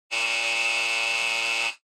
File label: Electromagnetic Buzzer, Dry